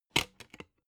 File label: dvd case remove dvd